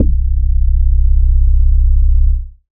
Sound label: bass C, Sub Bass, Dance Synths; ProSamples vol.37